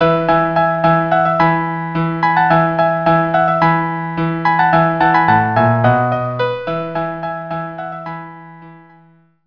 trio

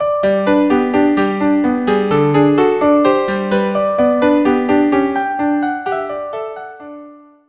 viola duo allegro

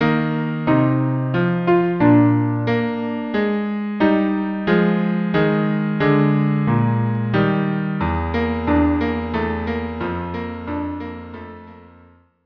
viola cello

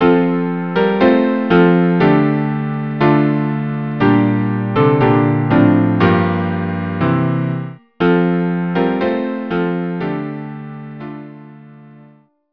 quartet; In the Bleak midwinter

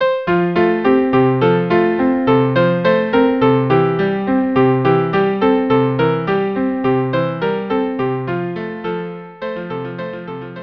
Dreidel song viola duo

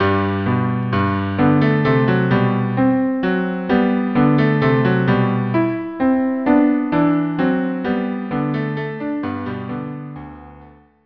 minuet cello duo

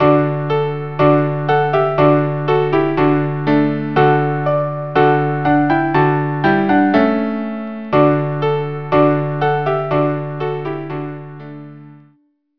trios for 4

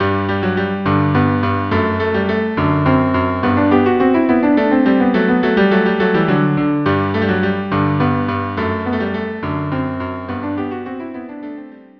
cello duo polonaise

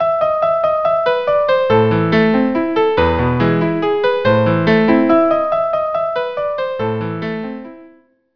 Fur Elise Trio

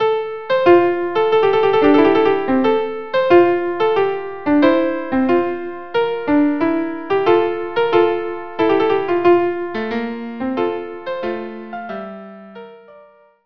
violin duet

